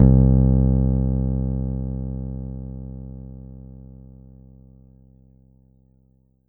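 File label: Yamaha TG100 Finger Bass C2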